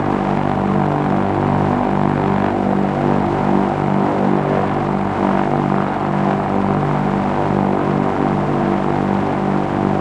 plane; World of Tanks

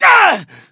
pain

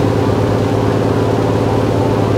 an2 cockpit r, engines; War Thunder

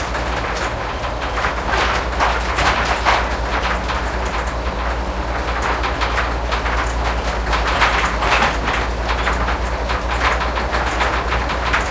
wheels speed 66, engines tanks; War Thunder

